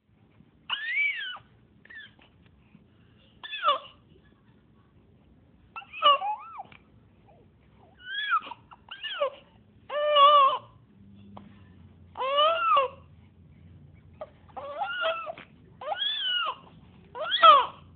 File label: baby sounds